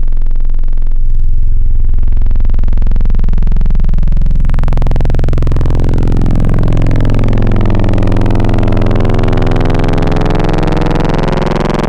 triangle (metal); Synthetic's Serum Collection

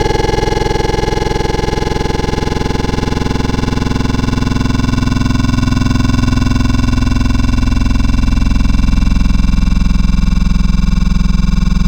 Vocal Ah, Vocal, Wavetables; Synthetic's Serum Collection; ESW Core Wavetables; Echo Sound Works Core Tables

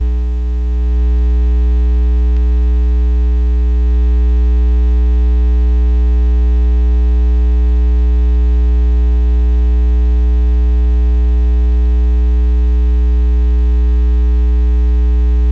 Outdoor Light Timer; Electronics Of My Grandma's House

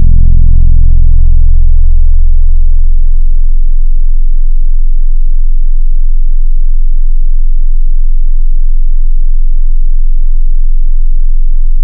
Hard 808; Synthetic's Serum Collection

